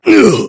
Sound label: heavy pain sharp